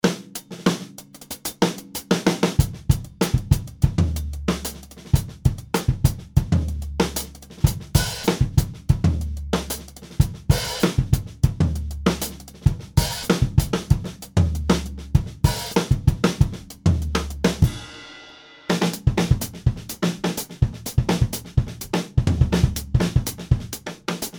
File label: Tasty Beats